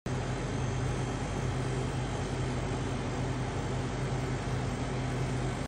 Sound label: Air conditioner